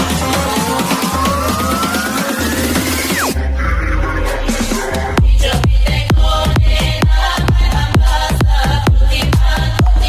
arabic remix ringtone

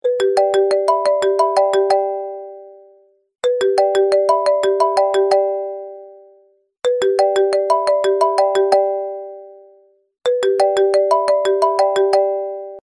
iphone ring

